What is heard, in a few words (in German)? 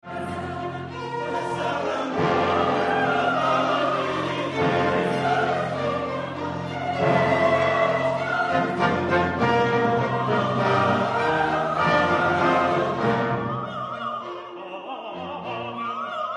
Schlusschor; Schoepfung